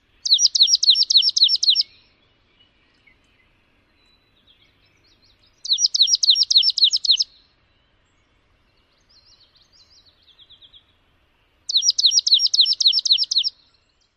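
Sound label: very slow pulse-rate song, Swamp Sparrow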